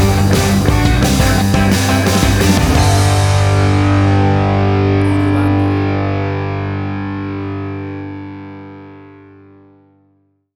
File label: Big and Bold Pop Punk Rock Music Stinger